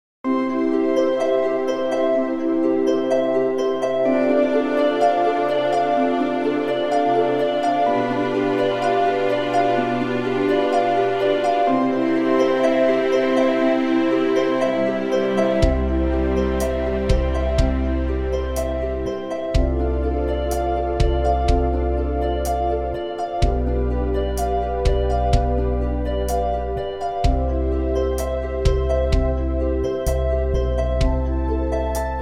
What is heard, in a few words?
modern piano minus